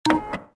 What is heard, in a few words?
button; Star Wars Jedi Knight II Jedi Outcast; XBOX; switches